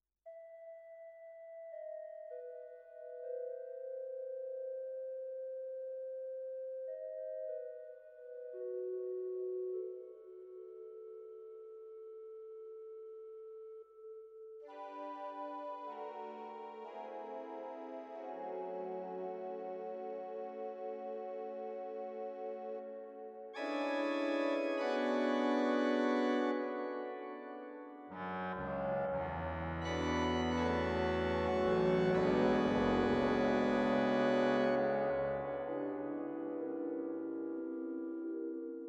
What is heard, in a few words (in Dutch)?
orgel impro